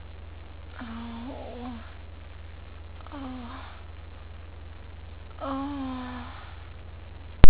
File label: Sex Moan